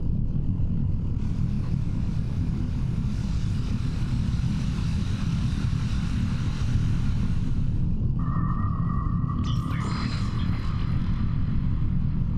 ship science ship idle; Stellaris; machineage

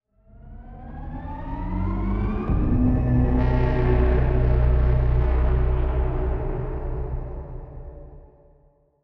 ship cruiser move; Stellaris; shroud; ships

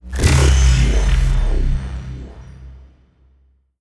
lootbox good; Stellaris; megacorp

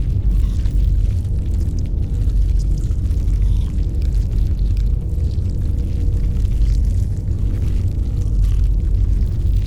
ant ship colossus idle; biogenesis

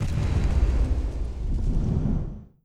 distance ambient large crystal station death; Stellaris

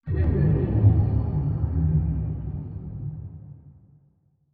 ancient drone; Stellaris

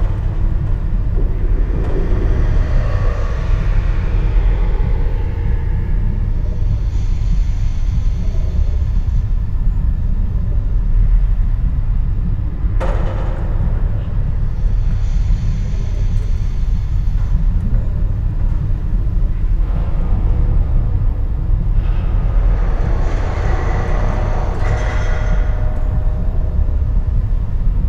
juggernaut arthropoid idle; Stellaris; federations

